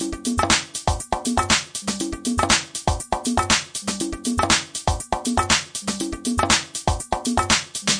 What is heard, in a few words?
my conga luvs drum machines, 120 bpm